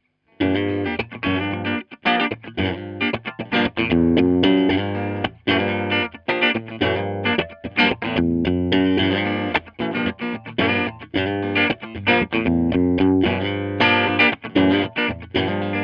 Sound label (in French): stratocaster rosewood clair